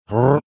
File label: wordle shake sound